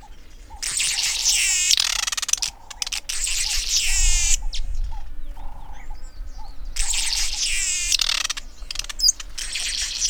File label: Ploceus rubiginosus